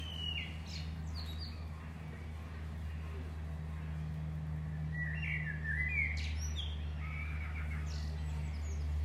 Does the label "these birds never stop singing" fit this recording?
Yes